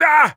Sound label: Wizard Pain